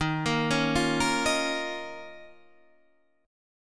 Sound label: D sharp 7th Suspended 2nd; D#7sus2 arpeggiated